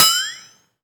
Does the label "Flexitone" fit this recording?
Yes